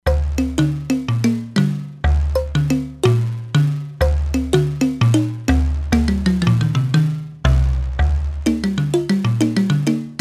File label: Afro Mallets, E-mu Proteus-3; Factory patches